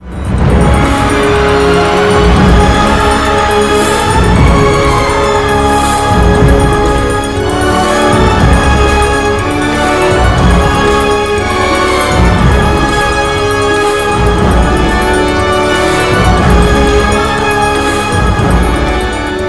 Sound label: end music; sc royals